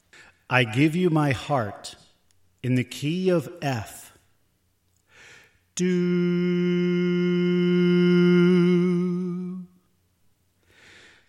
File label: Soprano Left